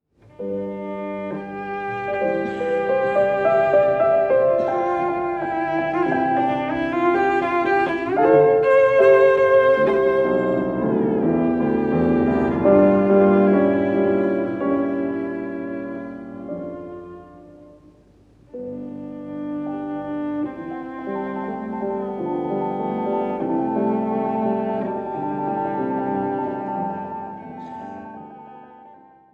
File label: Cello Sonata in C Major; Op. 102 No. 1; II. Adagio-Tempo d'Andante-Allegro vivace; Beethoven